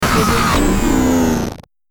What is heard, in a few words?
modern trend glitch